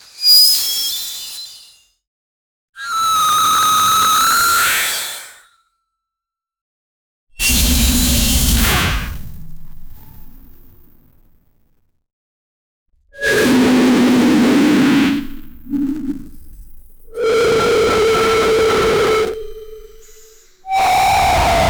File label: knights vs demons, armor